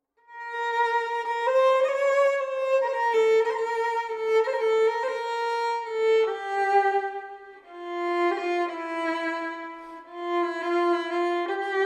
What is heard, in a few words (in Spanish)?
Danza Ritual incl violin